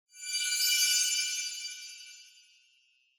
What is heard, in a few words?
Onoma Sparkle Slow Short